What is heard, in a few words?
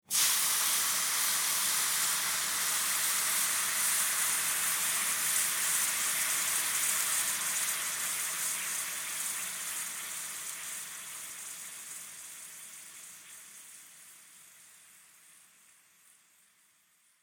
Household Sizzle Frying Pan Long; Kitchen